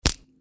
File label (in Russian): zvuk pereloma telesnye povrezhdenija